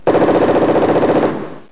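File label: machine gun burst; ambience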